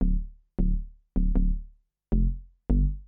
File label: onda fone bass